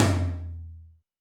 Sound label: Tom Lo Plate, Alesis D4 drum machine